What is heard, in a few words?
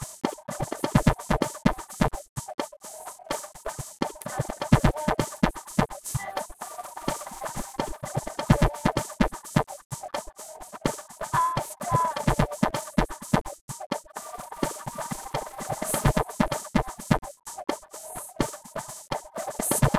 percussive